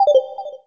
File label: Menu Popup; sgh-d720; Digital